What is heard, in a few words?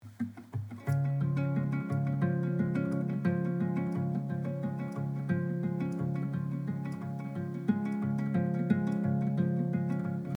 Tilt-A-Whirl GUITAR W SWATCH; the second hand ticks louder than a metronome